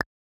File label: click